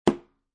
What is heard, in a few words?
Foley, Book, Hardcover, Close From Middle Of Book; Miscellaneous Office Sound Effects